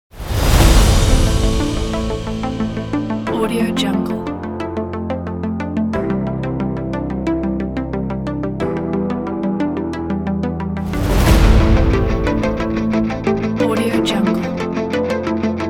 epic powerful sport drama

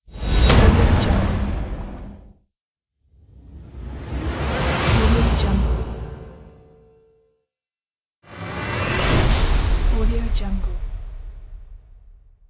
cinematic whoosh impact pack

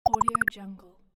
tech calculation

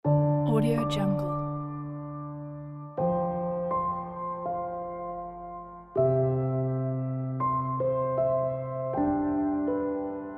dramatical piano

